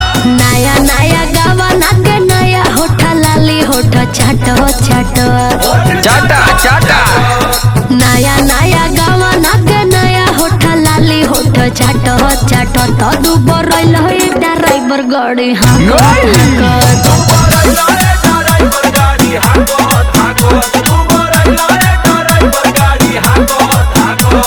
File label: Ringtone